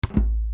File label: click; commandos